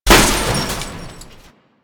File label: Electronics Misc Destroy; Warframe; Ambience; Nineteen Ninety Nine